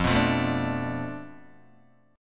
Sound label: F 6th Flat 5th F down Guitar DADGAD, chord